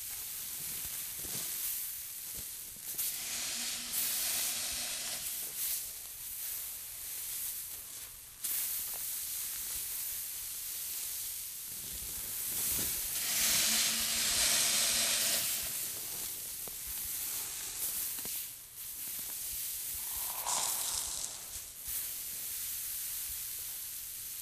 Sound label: backwater rice fall; Titanfall 2